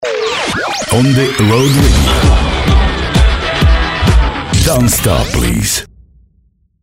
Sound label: radio jingle; ONDE ROAD RADIO; prova